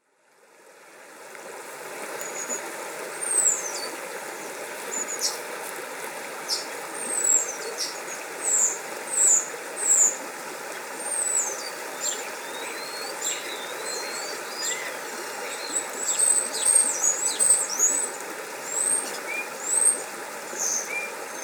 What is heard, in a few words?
Sunshower, alarms